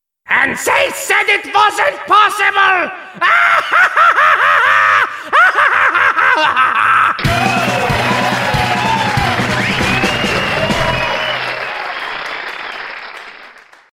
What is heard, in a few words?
Crasher red win music